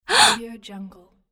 female gasp

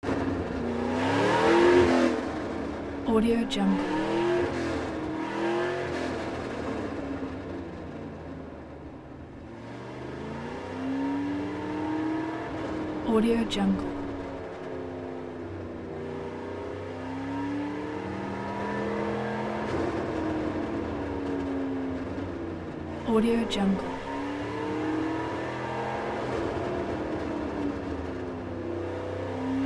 hot rod distant; prosoundfx

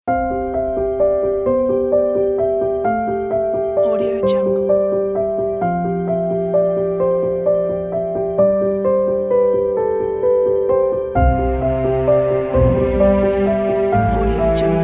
the romantic love story

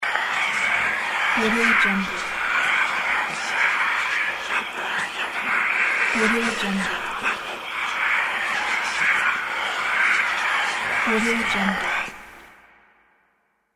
mysterious evil whispers

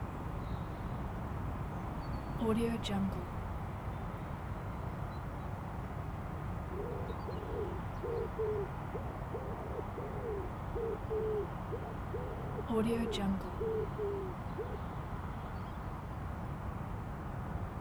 distant city ambience

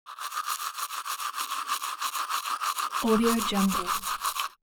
hygiene teeth brush wet open molars; foley; smartsoundfx

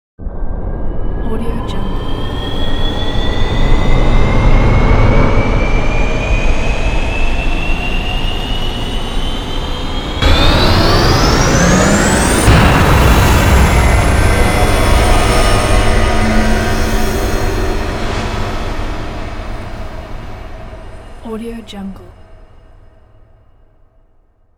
trailer

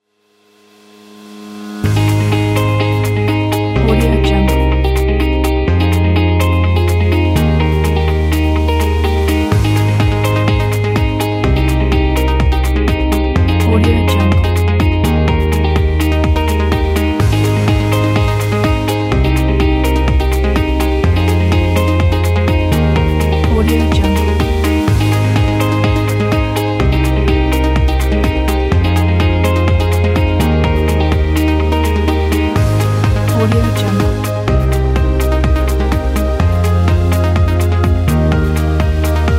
corporate promotion

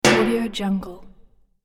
strike metal single impact

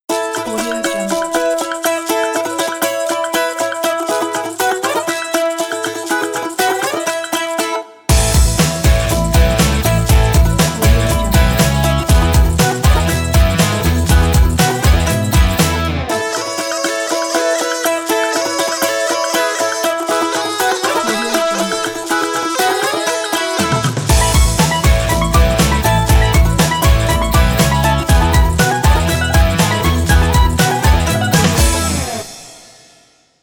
russian vibes

